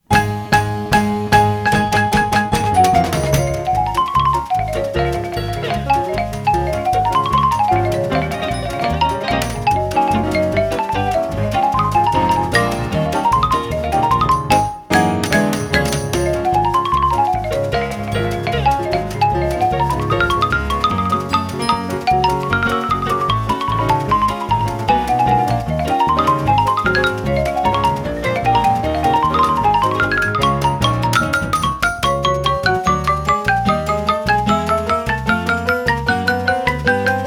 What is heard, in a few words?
Tambourine; Dancing